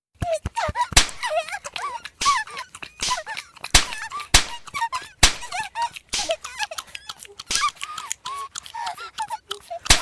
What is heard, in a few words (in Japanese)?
ハタキながら